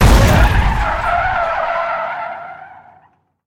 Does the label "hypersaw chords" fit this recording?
No